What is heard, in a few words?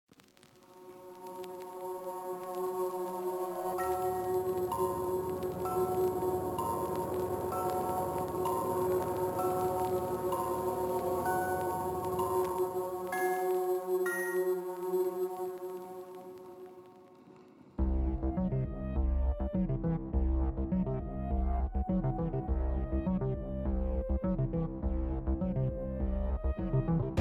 Epic Orchestral Cover